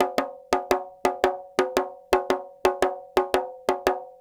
Petia, World Percussion

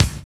KIK, Cheez Kiks; Club-50 - Foundations Roland

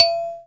KALIMBA; Spectrasonics; Heart of Africa; KALIMBAS

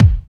KICK, Tekno Industrial; East West

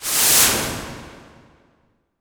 IMP WIPE, Cinematic Impact

